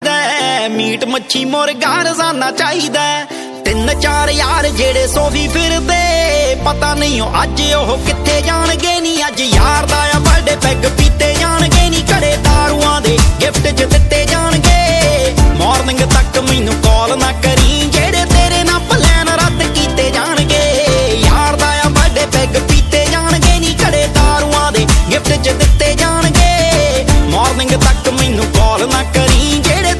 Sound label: Happy Birthday, Punjabi